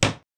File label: wood slam; wood metal SFX